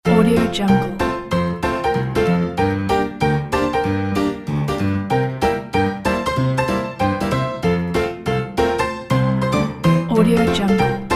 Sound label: retro ragtime; easytunes